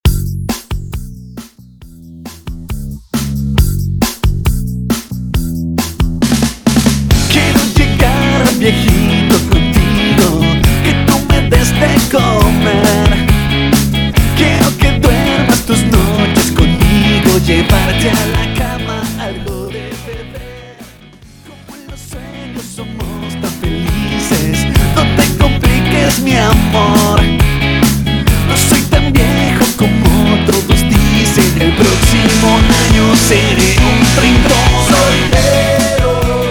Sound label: intro verso 136 bpm